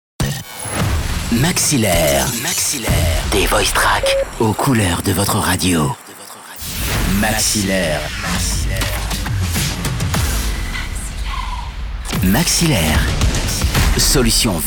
JINGLES SWEEPERS; Maxilaire

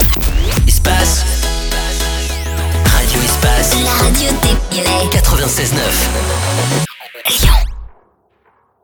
Jingles - Basic ID; PURE Jingles; Radio Espace

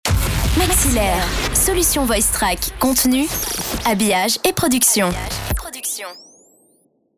SWEEP JAZZ; Maxilaire

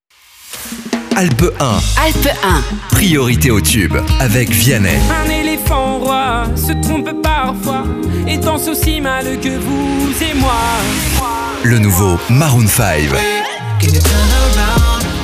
Promo Music; klemprod; ALPES 1

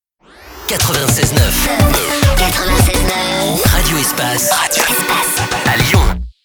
Jingles, Basic ID; PURE Jingles; Radio Espace

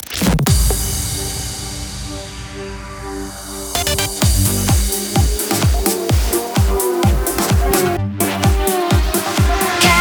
Jingles; PURE Jingles; Capital

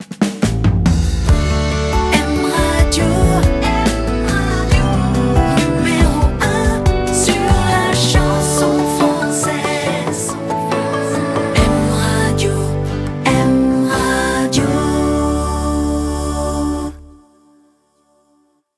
Jingles; PURE Jingles; M Radio

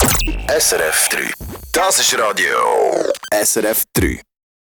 Sweeper; SRF3

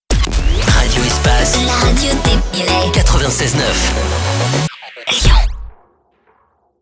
Shotgun ID; RADIO ESPACE

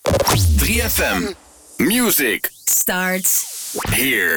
Sweep

